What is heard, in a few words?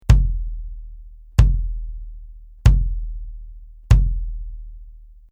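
BASS Clr Hard Plastic, bass drum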